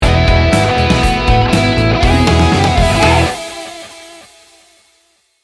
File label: jump sting bumper